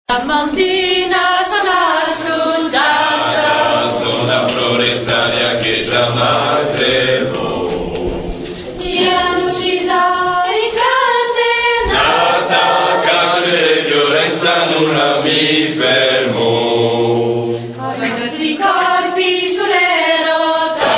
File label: Bass